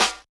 Yamaha DJX 2B, SD Break

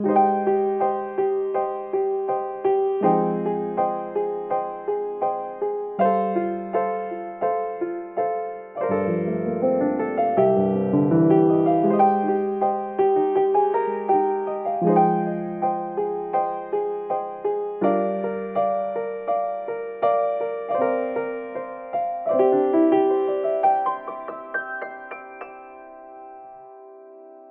old piano, Smooth RnB; 081 Cmin

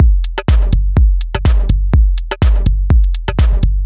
G 124bpm, House Drumloops; 90 s Sample CDs; Zero-G House Fabrik Drumloops; ZG Drumloops

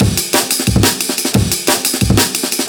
amen 2 bar 180, Amen Breaks

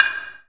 percussion, Ethnic Perc

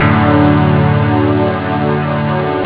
chord; hardnheavy; ST-XX Amiga Soundtracker Sample Packs

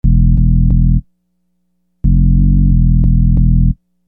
Groove Bass, Hip-Hop Street Beats; Construction Kit; Sonic Foundry (Sony Creative Software)

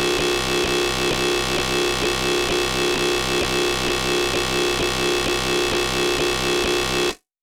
Drone; random and out of place

